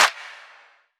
Clap, trap drum kit; MZ BEATS - FREE TRAP DRUM KIT

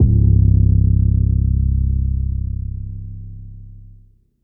808, trap drum kit; Kingkong; MZ BEATS - FREE TRAP DRUM KIT

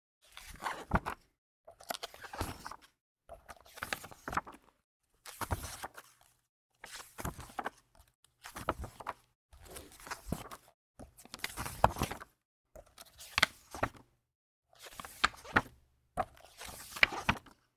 turn paper journal creak

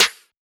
Snare, trap drum kit; MZ BEATS - FREE TRAP DRUM KIT

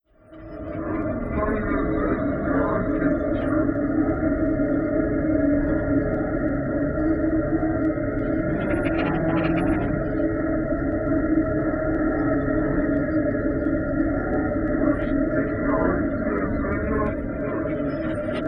Ambiance Deep; polygoniix Intro Essentials pt1

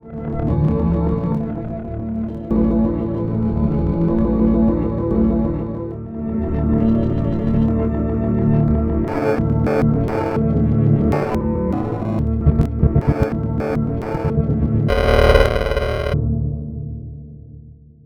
Ambiance Mod Guitar; polygoniix Intro Essentials pt1

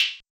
HiHats closed, trap drum kit; MZ BEATS - FREE TRAP DRUM KIT

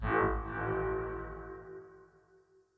fx, Glitches; polygoniix Intro Essentials pt2; Electronic; Firus fx

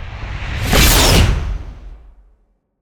Power Wooshes; SwooshSounds-1

